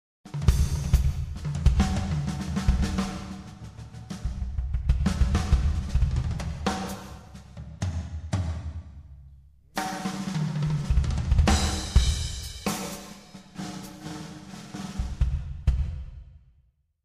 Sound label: fills